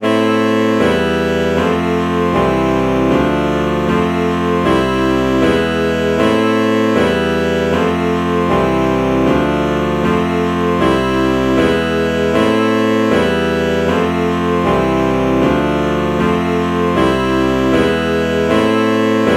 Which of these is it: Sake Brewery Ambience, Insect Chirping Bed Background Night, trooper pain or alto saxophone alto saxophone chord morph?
alto saxophone alto saxophone chord morph